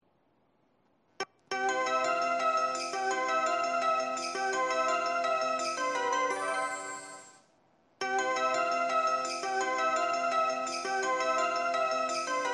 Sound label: utsunomiya melody uchi